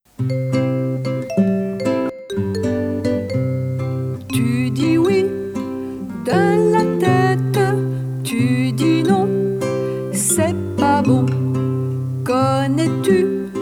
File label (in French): La chanson du mangeur de kiwi